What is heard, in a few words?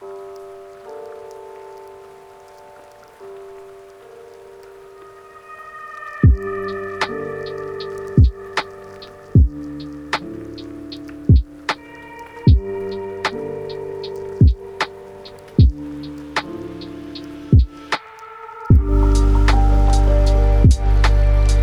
lofi hip hop